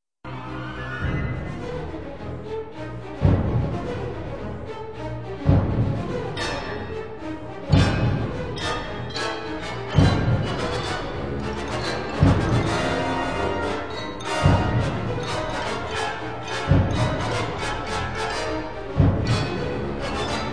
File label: brass and percussion; Corigliano